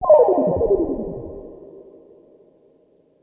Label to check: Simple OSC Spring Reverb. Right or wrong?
Right